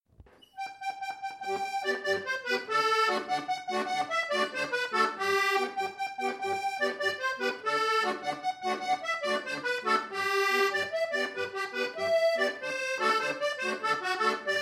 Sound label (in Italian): Tarantella Meridionale